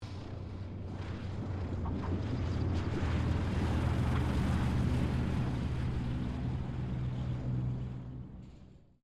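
AIRCRAFT YAKOVLEV YAK-52 LAND, Russian Training aircraft; Airplanes (Sound Effects:Vehicles)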